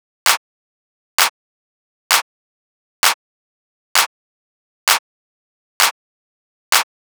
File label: Clap Loop 130, Techno